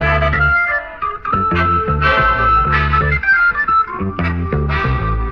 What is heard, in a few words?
horns and keys, Loops, Phonky